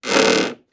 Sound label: footstep wood creak